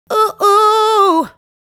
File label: "Oh oh", vocal phrases; Ultimate Vocals